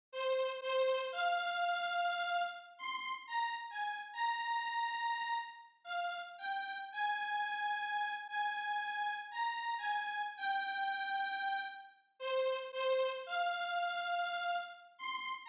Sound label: piano; tapion